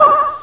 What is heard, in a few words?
warping; monsterb